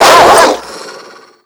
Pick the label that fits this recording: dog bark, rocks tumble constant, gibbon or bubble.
dog bark